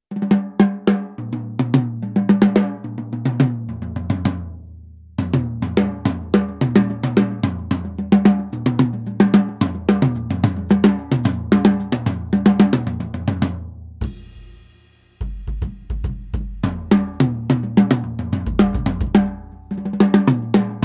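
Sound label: Gretsch Catalina Club Jazz medium No FX, drums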